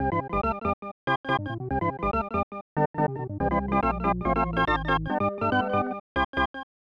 Organ Jingle; super bases loaded 3; snes

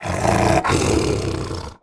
growl

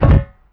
walk metal

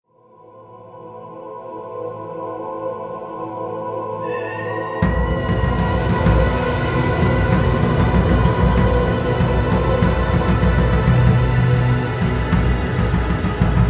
Wind Music; children of morta

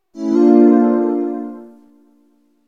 Menu Turn Side; GameCube System Sounds; GC

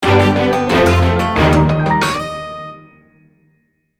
arrow jingle; Wario

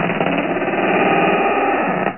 Door; Buggy; PS1

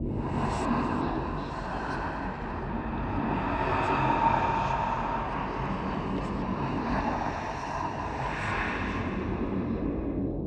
Hush Loop; we are the dwarves gamerip 2016

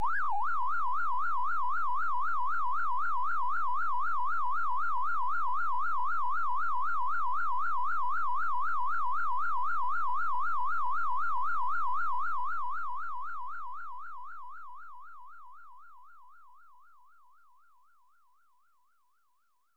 In-Game Ambience; pac man 2 the new adventures 1994 snes

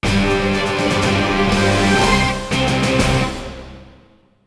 Sting Ui Mission Complete

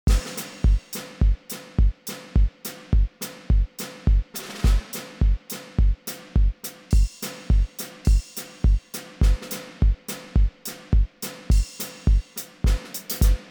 Battle Layer1 Perc; Plants vs. Zombies Battle for Neighborville

